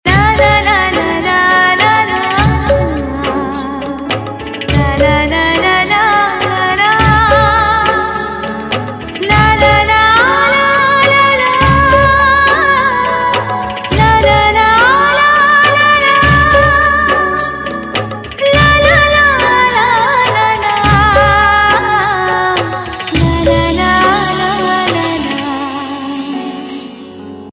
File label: Instrumental; Kis Desh Mein Hai Meraa Dil